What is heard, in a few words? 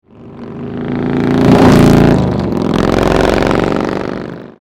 FLYBY